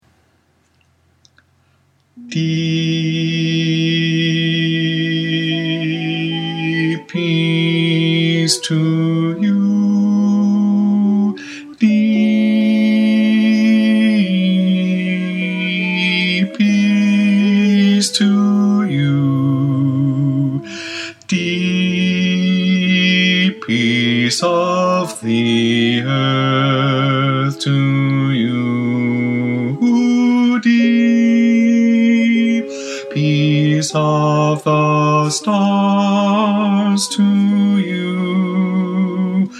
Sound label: Deep Peace Bass